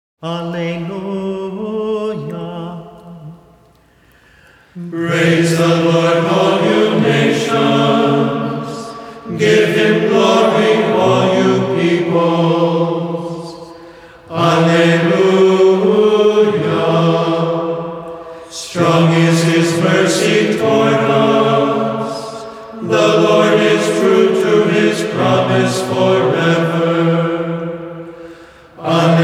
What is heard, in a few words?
Interfaith Music Medley Vespers Psalm 116; New Camaldoli Hermitage